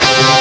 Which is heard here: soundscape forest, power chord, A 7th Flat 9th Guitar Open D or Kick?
power chord